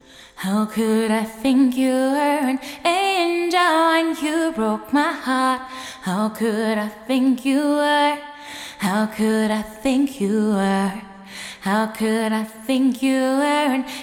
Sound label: vocals dry wet; eventide 2016 stereo room